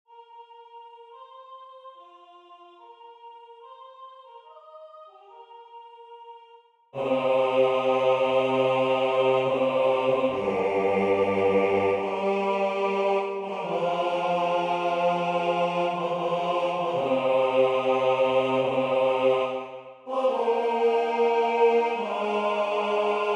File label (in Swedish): med solist Eb BAS; O helga natt